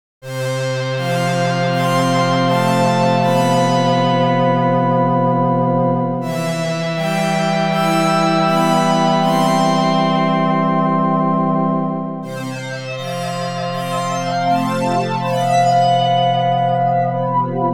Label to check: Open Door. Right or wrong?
Wrong